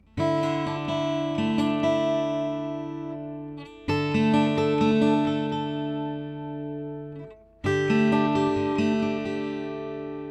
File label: yamaha fg300d